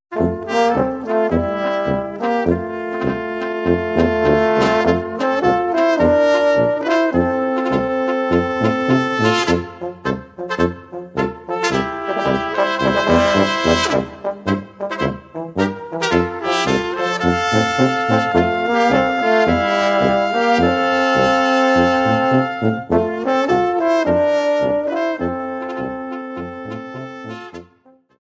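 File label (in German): südböhmische polka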